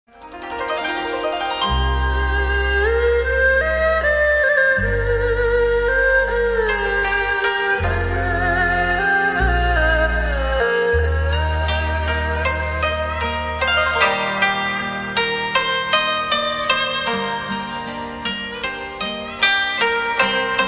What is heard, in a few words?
Thai Sound